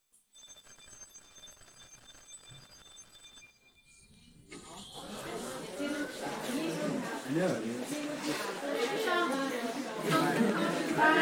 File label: bell 2nd floor vocals